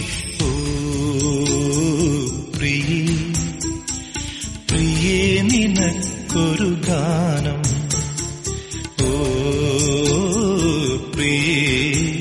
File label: Sad Tamil